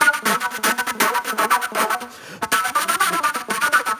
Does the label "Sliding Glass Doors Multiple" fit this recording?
No